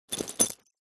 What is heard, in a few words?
industrial bolts metal few small pick up from carpet